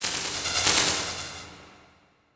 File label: Transition Electrical Surge; Adobe; Production Element